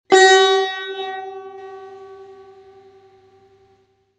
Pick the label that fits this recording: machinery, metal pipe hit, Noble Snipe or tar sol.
tar sol